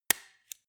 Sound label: stapler